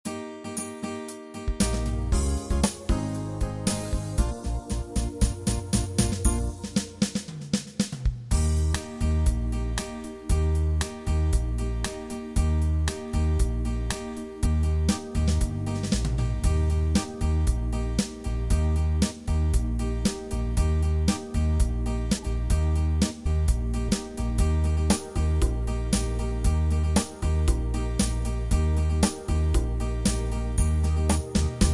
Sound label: 8beat